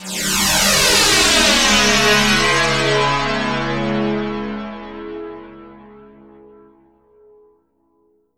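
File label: PIANO FX; Q Up Arts; Denny Jaeger Private Collection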